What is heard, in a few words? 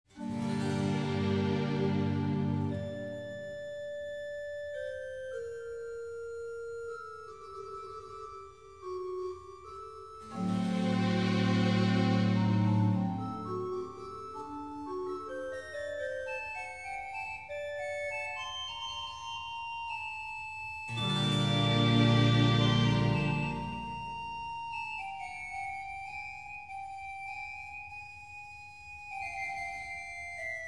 Organ Concerto in B flat major Adagio e staccato; Handel Masterworks; Organ Concerto Op.4 No.2 in B flat major - Adagio e staccato